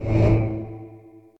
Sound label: summon; Wizardry; ebwizardry